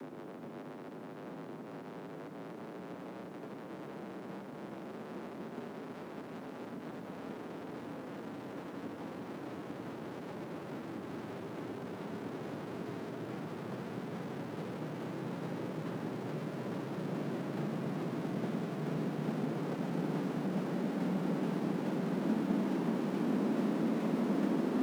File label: 128BPM Noise 2Bars, Clean Noise Ramps; FX Fills; Vengeance Samples